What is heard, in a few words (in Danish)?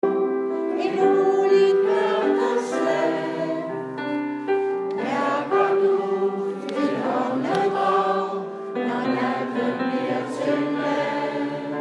når håbet grønnes melodistemme